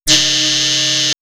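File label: Magnetic Field, electronic fx; X-Static Goldmine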